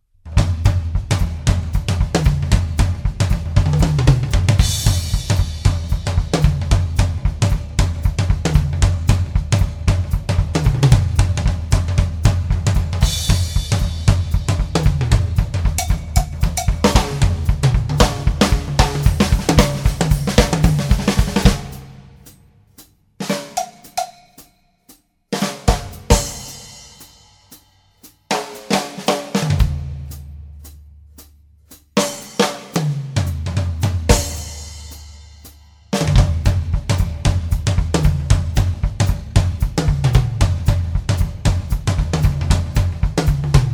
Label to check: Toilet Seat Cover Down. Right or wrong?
Wrong